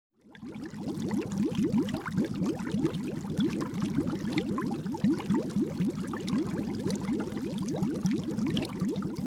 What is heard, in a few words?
pump; doctor teeth 2